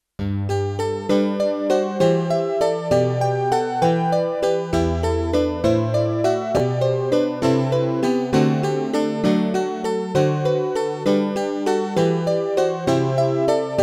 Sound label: Jesu joy of mans desiring